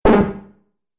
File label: Multimedia Button; Button Sound Effects; Computing Noises; Andrew Fleming